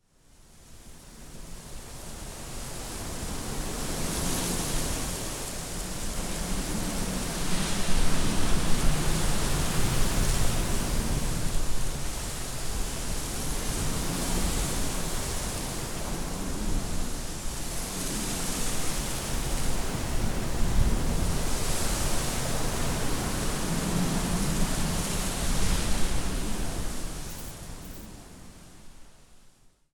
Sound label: wind leaves